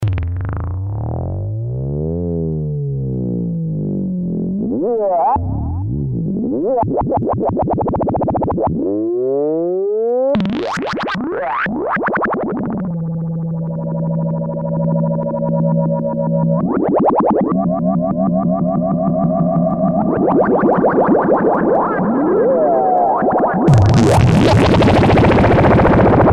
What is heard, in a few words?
lfo fx